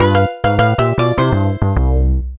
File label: Lose Life; Super Mario 3D Land